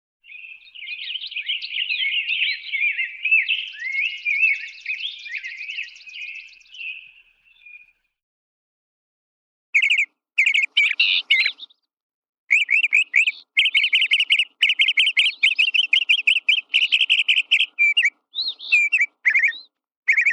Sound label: Birds, Various Many Birds Chirping, Animal